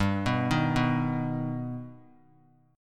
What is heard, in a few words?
G Augmented G, chord; G+ arpeggiated; 19,18,18,21; JGuitar